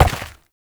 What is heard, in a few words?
Step Dirt; Warframe; Uriel